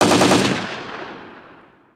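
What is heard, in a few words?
distant gun, outdoor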